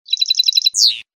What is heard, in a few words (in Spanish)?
tititichio, campero